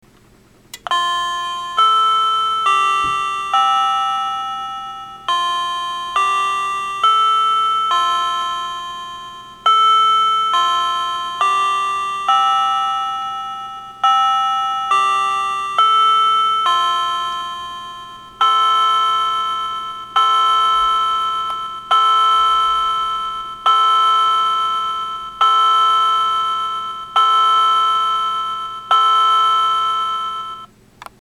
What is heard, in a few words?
westminster chime; q2501 series